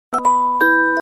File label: Sms Alert